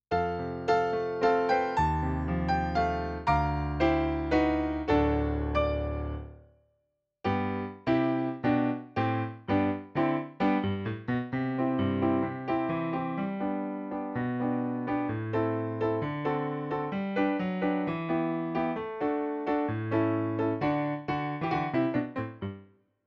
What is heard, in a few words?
Piano